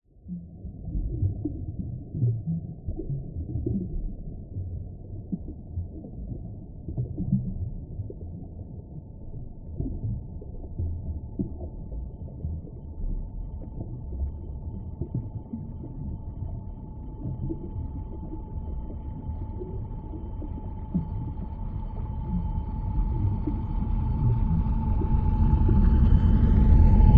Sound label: vehicles submarine pass by underwater r to l water bubbles ambience